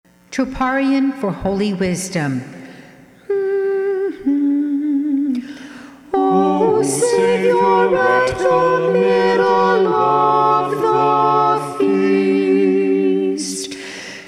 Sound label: troparion mid pentecost SAB, choir